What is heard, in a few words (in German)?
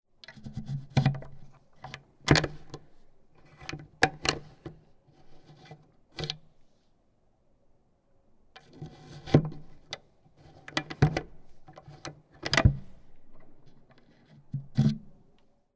Damensekretaer schliessen; Tibattant